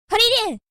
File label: attack